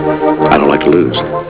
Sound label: LOSE